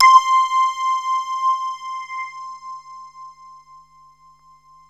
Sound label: BASS A, MORPHEUS; Syntec; Wall of Sounds